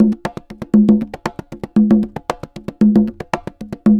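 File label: CONGAS SALSA, salsa drums and percussion; Best Service ProSamples vol.14; AKAI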